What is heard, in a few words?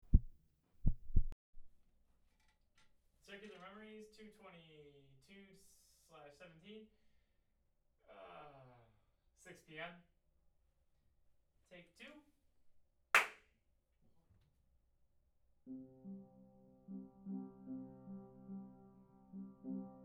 Piano Comp